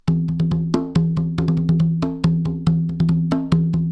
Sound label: congas